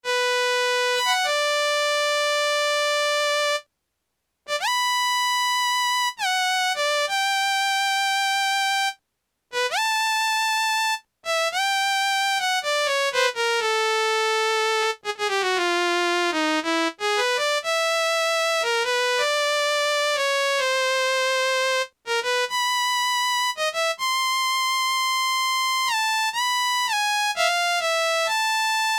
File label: VIOLIN, Yamaha SHS-10 factory voice